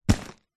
luggage fabric make up bag grab

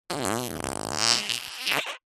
cartoon fart

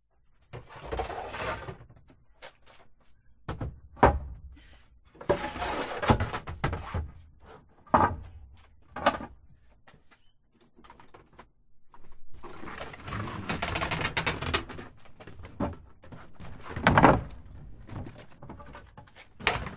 industrial workman window cleaner unloading van

